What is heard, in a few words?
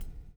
REV; Spectrasonics - Backbeat